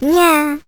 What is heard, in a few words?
Imp Throw